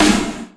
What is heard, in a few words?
snare; osu